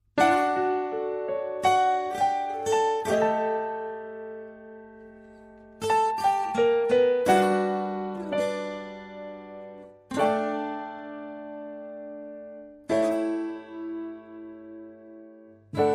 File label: vietnamese song ringtone